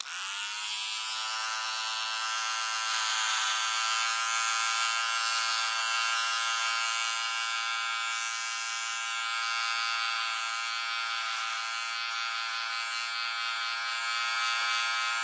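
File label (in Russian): звуки машинки для стрижки волос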